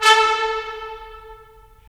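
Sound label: brass trumpets staccato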